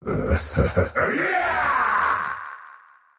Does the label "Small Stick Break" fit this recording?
No